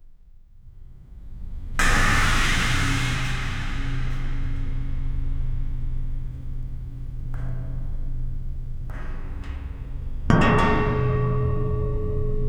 tamil prison ambience

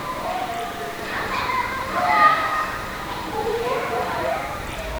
winter rural area childre, light breeze

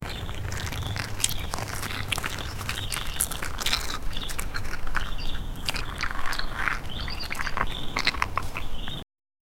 deer eating grass

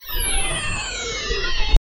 receiving healing spell

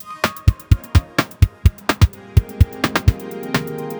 generate a powerful outco, audio branding

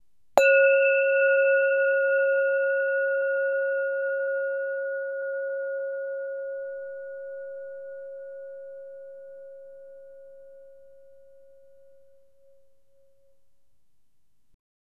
tibetan singing bowl stru, calming

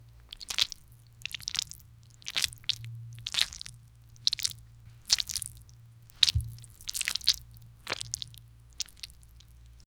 thicker slime sound dense

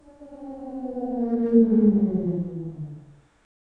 something evil gazing at

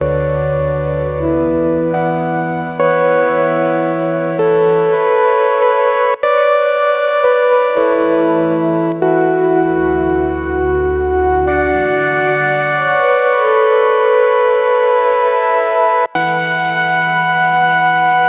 warm analog synth pads, 78 bpm

